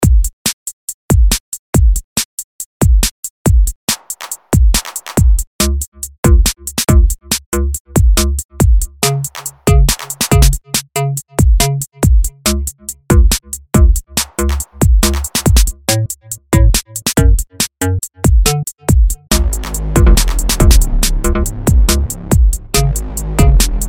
improvisation

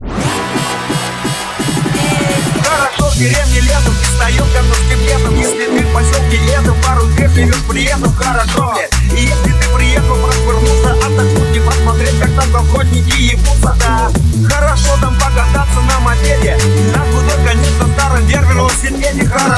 DNB

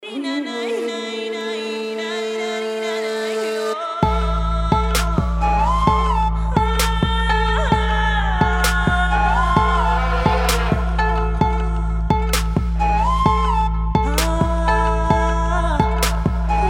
arabian trap

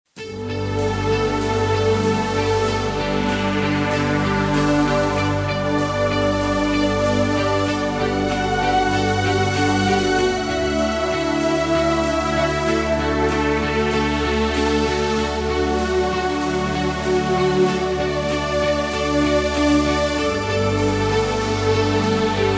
Happy Mood Background Music

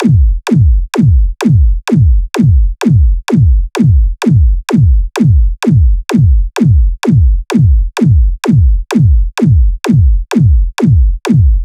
Beat Beer Party 128 bpm

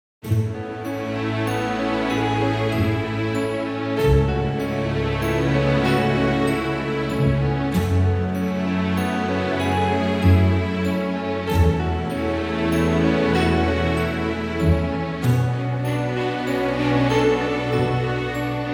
Romantic Inspiration Background Music

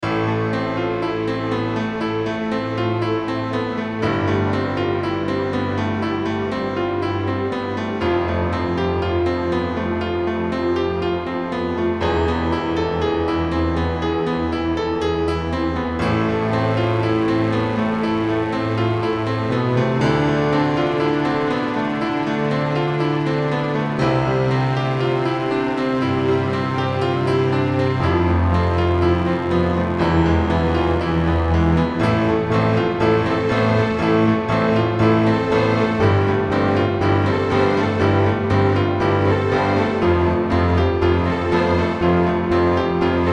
Apocalyptic Keyboard

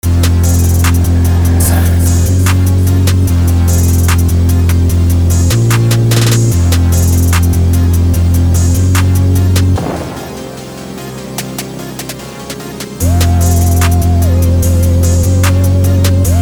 148bpm

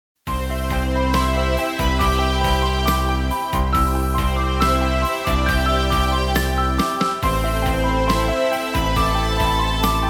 Bright song Background Music

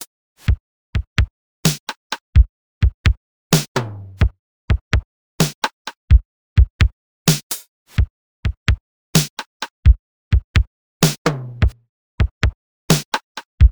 Drum Loop D-707

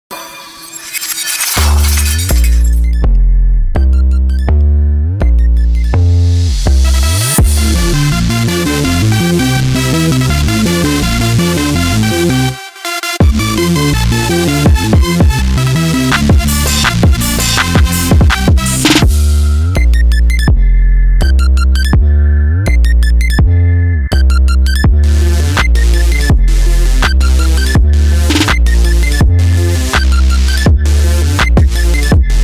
but its roblox obby music

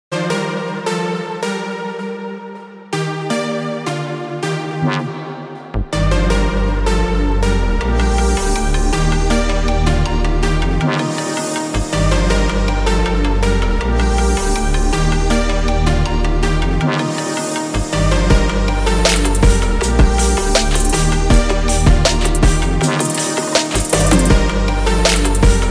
Trap Drift

